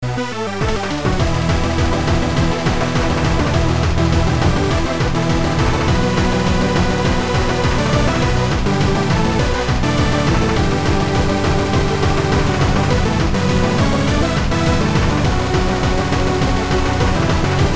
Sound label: Boss Battle; Dead Guild